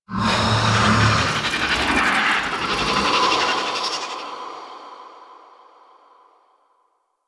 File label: Damage After Break; Cinematics; Stalker; Warframe; Orbiter Cradle